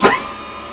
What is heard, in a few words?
Aircrafts Cockpits Fuel Pump Begin; DCS